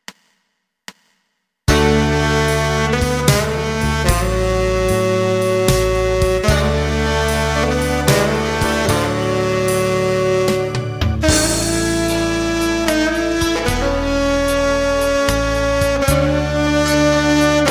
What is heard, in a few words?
Silent Lite Alt